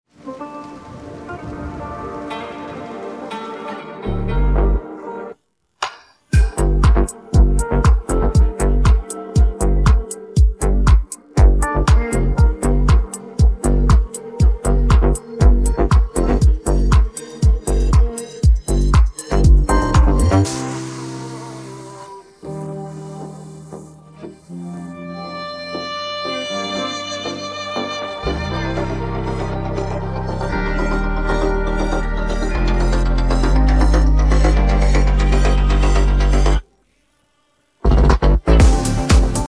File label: instrumental; persian